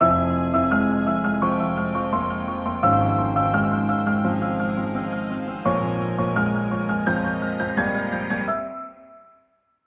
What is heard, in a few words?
Piano Game Over Theme 85 Bpm F Minor